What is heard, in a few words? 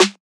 airy snare sound f sharp key